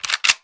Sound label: bolt pull, weapons, colt 727